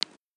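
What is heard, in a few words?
Click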